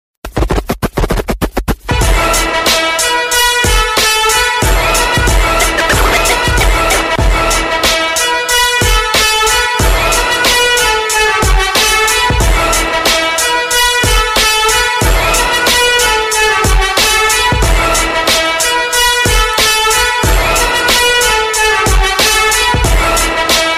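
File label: in hip hop style, variant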